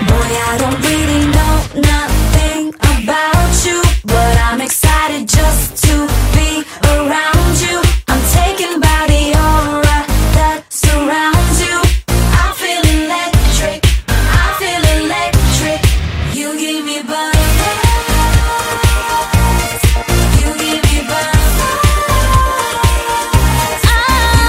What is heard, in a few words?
Electric